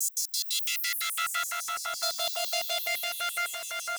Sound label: Synth loop (120BPM); cinematic drama; Loops; musicradar